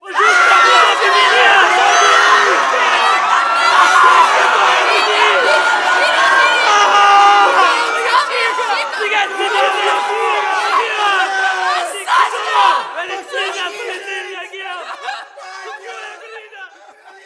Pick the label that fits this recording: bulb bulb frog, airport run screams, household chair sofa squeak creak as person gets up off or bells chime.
airport run screams